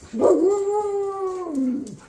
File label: shiba woo